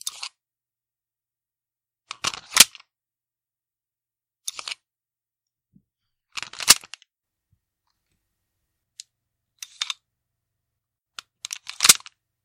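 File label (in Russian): у пистолета Browning Hi Power 3 раза снят и заменен магазин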